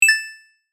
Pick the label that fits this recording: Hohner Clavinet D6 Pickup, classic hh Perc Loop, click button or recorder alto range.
click button